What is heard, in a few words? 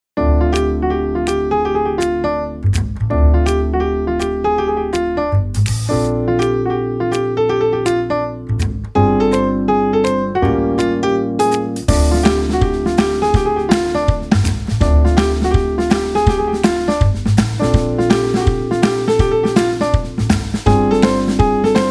music